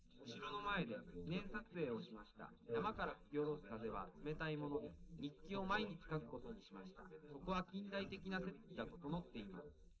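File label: male, live recording 130ms 1m; SiSEC11; underdetermined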